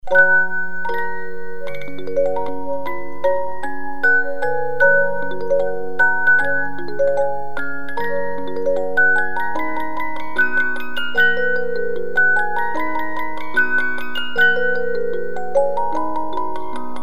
Symphony No 5, 50-Note Movements; Beethovens

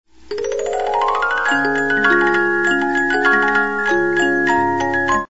O Suzzana, 50 Note music box

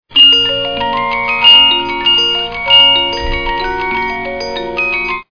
Swan Lake, 50-Note Movements

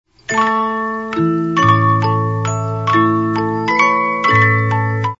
Fireworks Music, 50 Note Movements

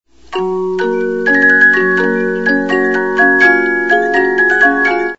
Hornpipe, music boxes, 72-Note Movements; Watermusic

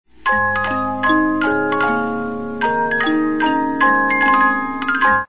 Gaudeamus Igitur, 50 Note music box

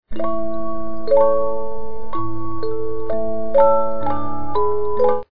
Eine Kleine Nachtmusik Romance, 50 Note Movements

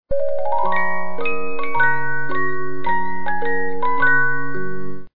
Carmen, 50-Note Movements

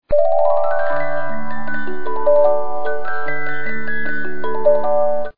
72-Note Movements

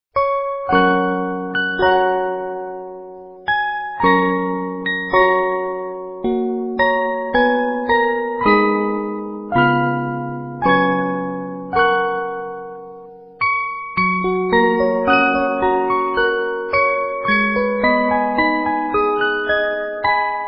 O Tannenbaum, 50 Note Movements

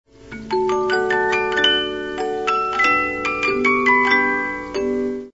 Maidens Prayer, 50 Note music box

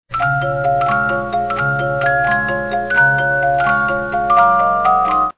Tales From The Vienna Woods, 50-Note Movements

